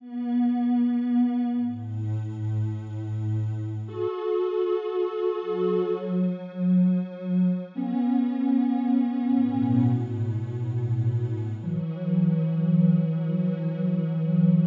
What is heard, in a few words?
ambient-ish remix; ambient ish stuff